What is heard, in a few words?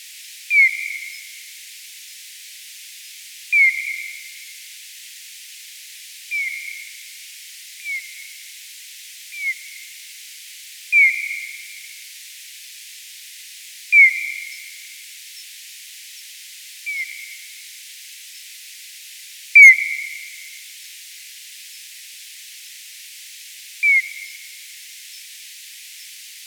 E isabellinus; Extremadure